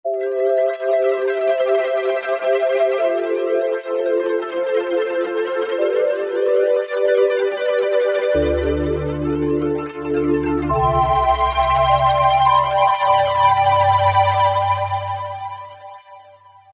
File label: Korg M1, Factory preset examples, Programs; Nimbus